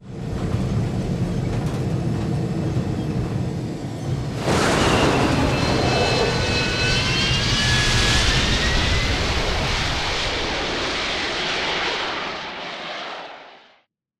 flyin; crow; classic mp intro; Titanfall 2

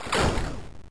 bullet generic titan shield scatter; Titanfall 2

